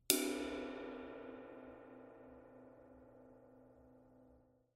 23 Custom Special Dry Ride Crash

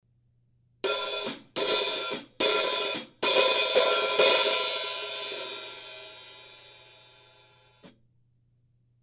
S Hats Pattern, cymbal sound

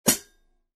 12in Special Recording HiHats, cymbal sound

